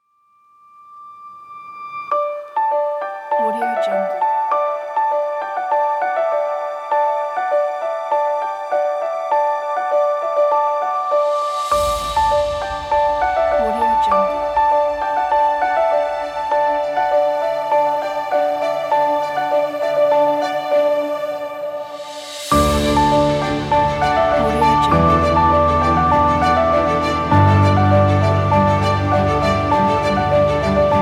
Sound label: emotional piano cinematic trailer